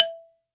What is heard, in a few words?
BALAFON; Best Service ProSamples vol.52 - World Instruments 2; AKAI